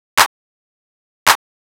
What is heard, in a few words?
Snare, Trance